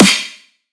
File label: Heavy Snare, Dubstep; Drums; One Shots; Snares; Cymatics; Dubstep Starter Pack